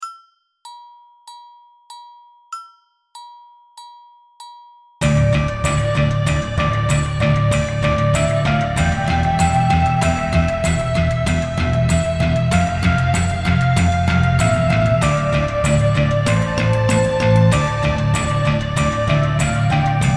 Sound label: Metal Work Dr